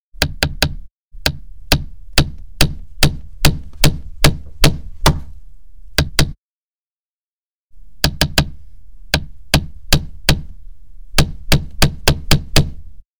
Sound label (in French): marteau